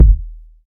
smooth bottom end bass drum D# key, Royality free bass drum single hit; kick